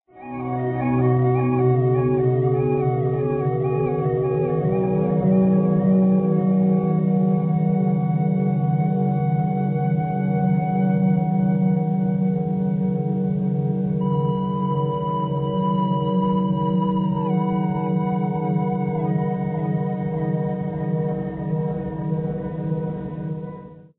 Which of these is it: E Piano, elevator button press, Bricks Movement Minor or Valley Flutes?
Valley Flutes